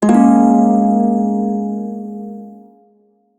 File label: Prime Shawzin Phrygian Chord, Music; Warframe